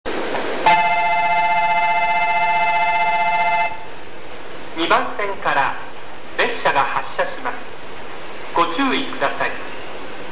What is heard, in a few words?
bell; kanayama